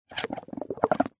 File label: nature water blowing air bubbles in bowl of water foam short burst